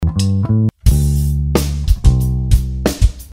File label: Bass Loop Drums